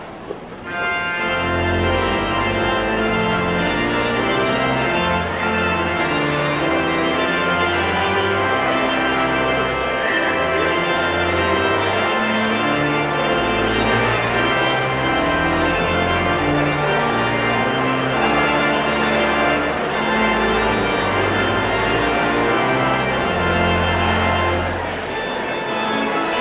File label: Postlude